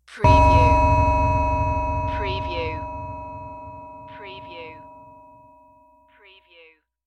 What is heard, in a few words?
Ringer